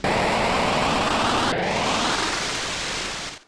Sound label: Dual Blade; RPG Maker; Legend of Heroen v1.20